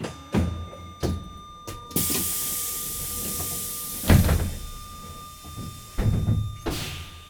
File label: bus inside; best service Blue Box